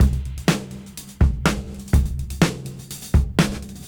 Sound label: Disk 125 BPM; AMG - Keith LeBlanc Phat Butt Jams